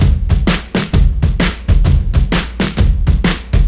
black glitter loop; Marshall London KB 1501; alarms

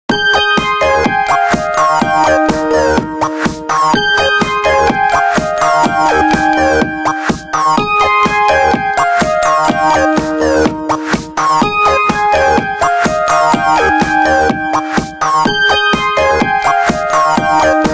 Ring Fancy, Windows Mobile ringtones; Microsoft

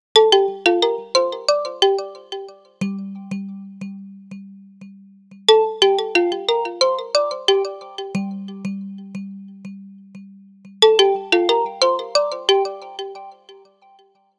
ringtones; Coolpad Dazen X7

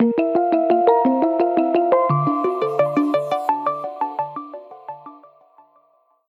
Stereo Light Waves, ringtones; Lenovo; ZUI 13

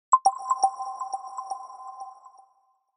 Radar, notifications; Infinix; XOS 10

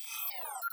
front camera science fiction pushed; Vivo X27 Pro; ui

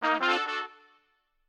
Brass Honk, notifications; Samsung; Galaxy A56; Retro

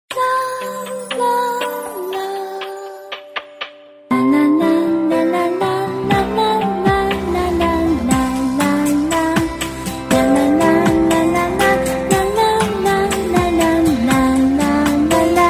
Cityscape, ringtones; Coolpad 5010

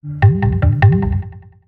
Wiadomosc (Message), phonetones; Play; Poland

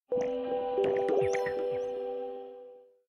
shutdown, ui; Samsung Galaxy-SL-SCH-i809

